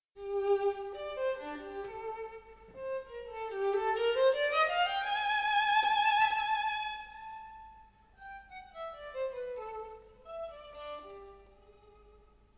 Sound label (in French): Concerto pour violon et orchestre Allegro